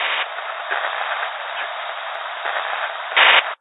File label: AIRBOSS Noise; dcs-retribution